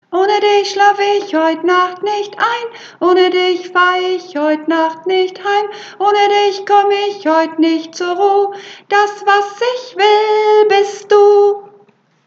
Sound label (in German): Hohe Stimme, Uebungsaufnahme